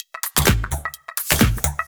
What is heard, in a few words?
Electro Loop 128BPM; Vengeance Samples